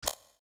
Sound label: Slider sweep; Samsung Z3